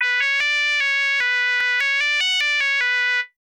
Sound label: Unknown Jingle; Normy's Beach Babe-O-Rama; Genesis